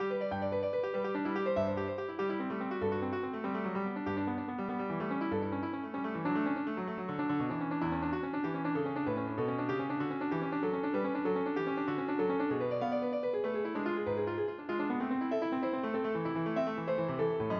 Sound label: p G maj; Massive MIDI Collection